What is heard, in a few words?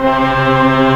Organ, Big Barton Pipes